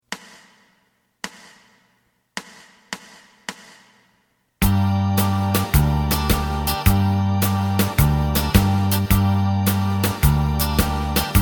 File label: lead